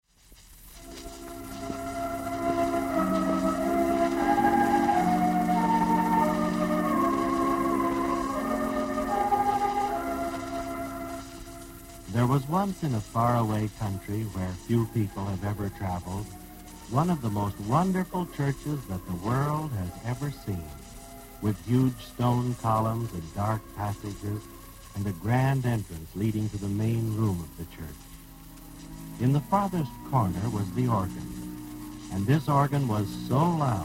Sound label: chimes; Kiddie Rekord Music Library